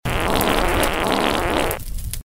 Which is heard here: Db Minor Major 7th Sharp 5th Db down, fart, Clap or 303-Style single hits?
fart